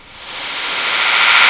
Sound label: Sweep; Urban Shakedown